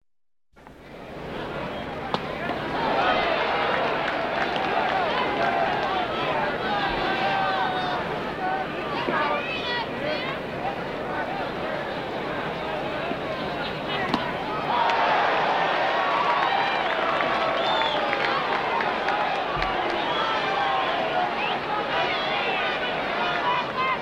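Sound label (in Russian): отбивание мяча в бейсболе на фоне болельщики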